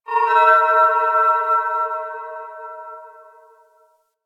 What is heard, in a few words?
Moon Chimes Medium, Ambience; Warframe; Orokin